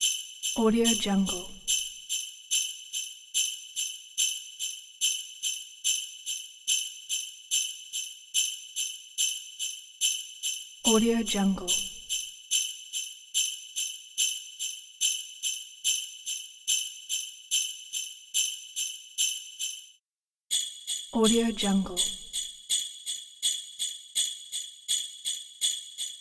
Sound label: jingle bells; legatopromusic